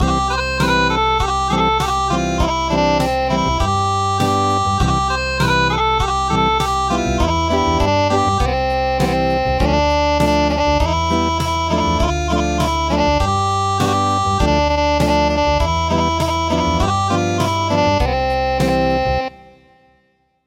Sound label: Acoustic; Two Roosters